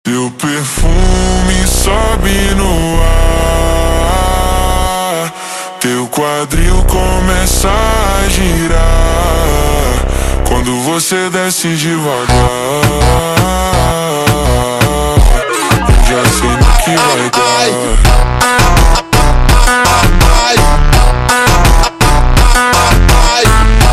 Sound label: super Slowed, funk